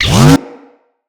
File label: Osprey Shield Funnel Start; Characters; Corpus